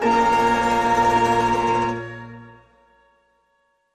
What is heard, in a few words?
Hit